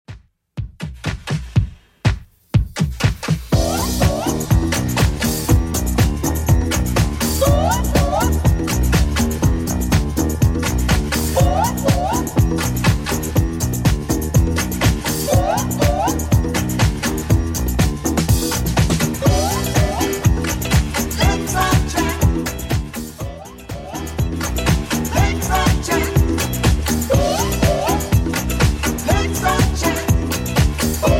80s Redrum 122bpm

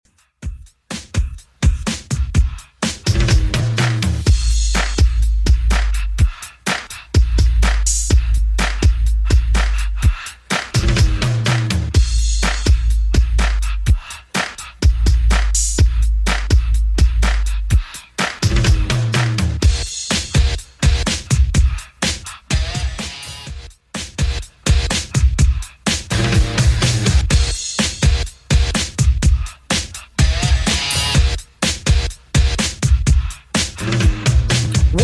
80s Redrum, 125 bpm

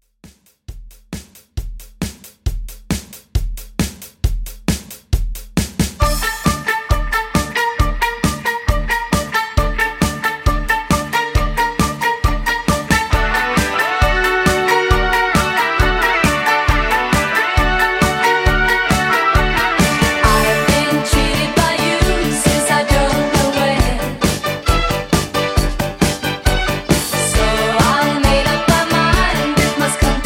70s Pop ReDrum 135Bpm

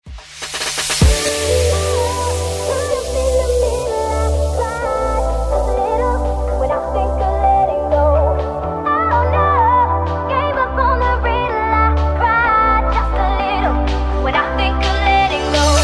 Afrobeat Redrum

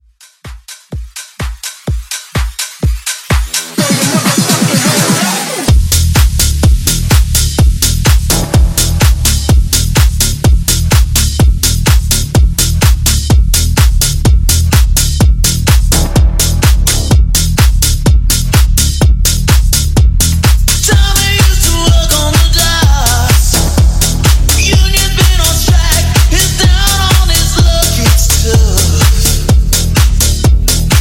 Pop Rock Rework; Remixfreakz

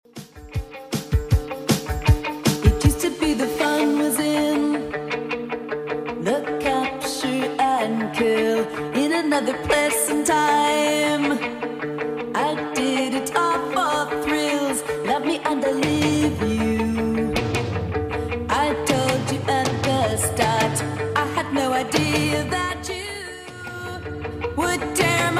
80s Redrum, 157bpm

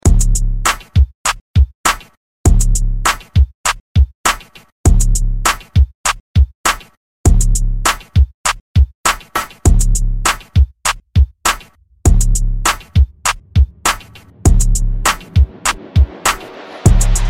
Mainstream VIP Hype Into Re Drum; Vegas Edit; 100 Bpm

